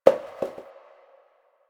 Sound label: Paper Towel Drop; Janitor